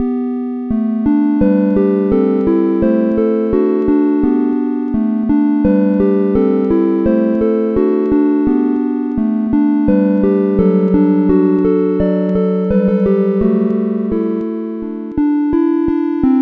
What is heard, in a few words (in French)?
harpe